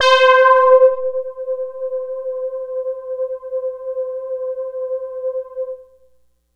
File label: Prophet 600 SYNTH Spring - C; One Shots; Cymatics; Fantasy Synth Sample Pack